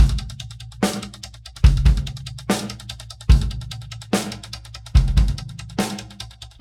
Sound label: drum loop 4 bar 4-4, 145